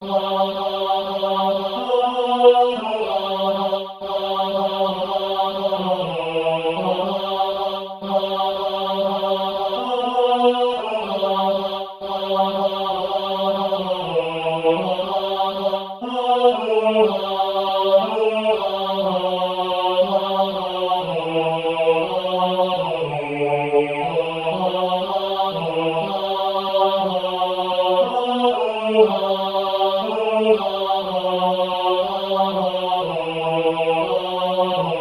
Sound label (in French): basses; les anges dans nos campagnes